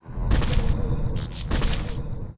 lihorn move, monster

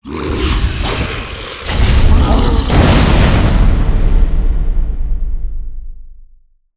arioch atk sp, monster

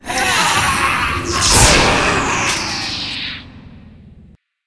elder lich dead, monster

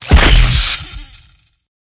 skill explo

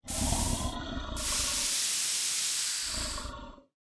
mech buffalo idle, monster

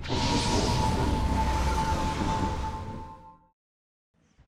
monster idle

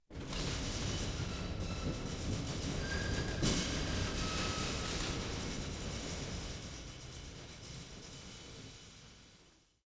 corridor, undeadtower

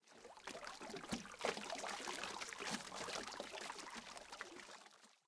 ruin, lake

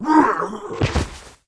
troll dead

